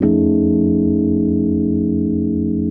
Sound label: RHODES; Houseworx